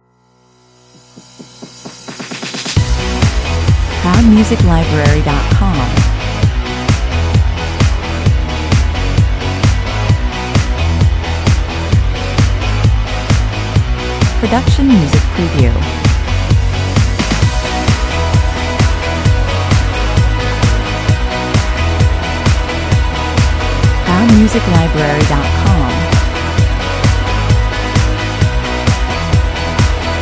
neon guitars (underscore version)

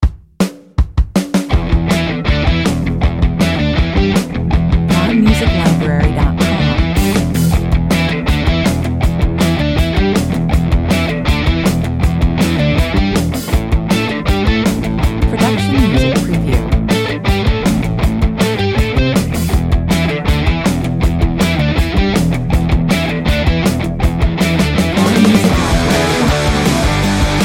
mental dropkick 160 bpm

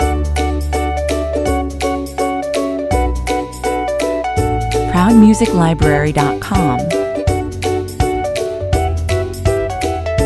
happy explainer loop

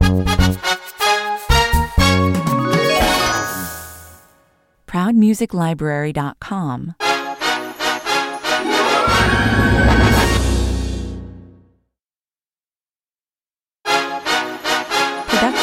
game show jingle bundle 122 bpm

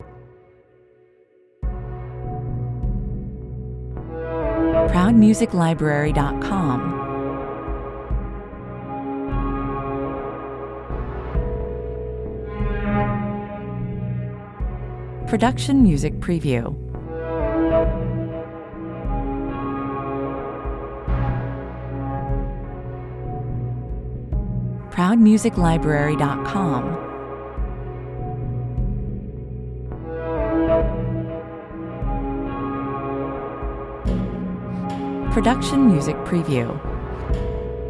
soundscape

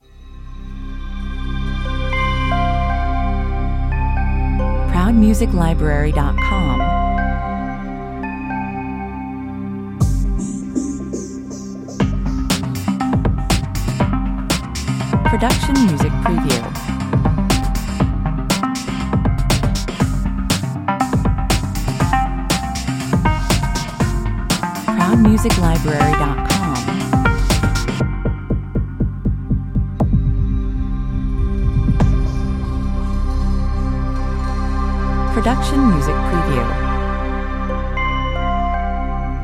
futuristic opener full 120 bpm

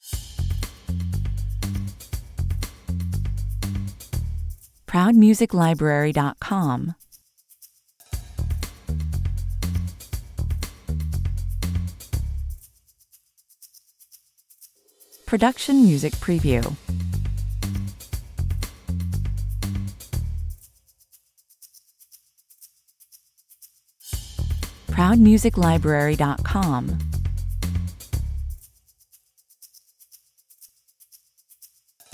bass drums perc

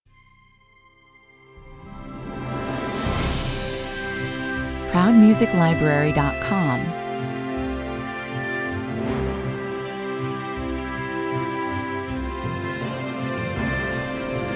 movie trailer positive 80 bpm

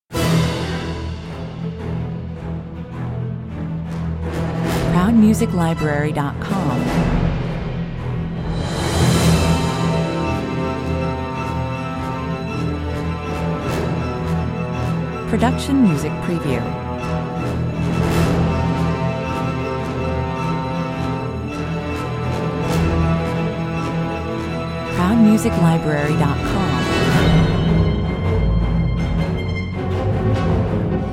orchestral fantasy fight 108 bpm

